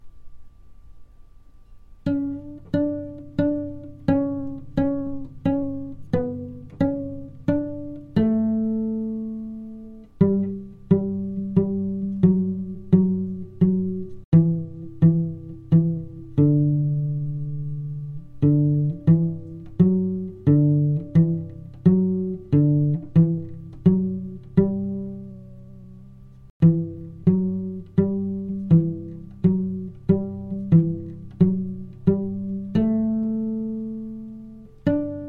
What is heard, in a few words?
French Pizz Cello